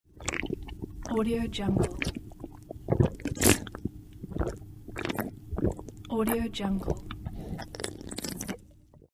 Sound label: drink